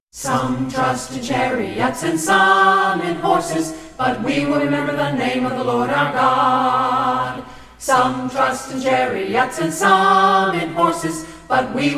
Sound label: a capella scripture song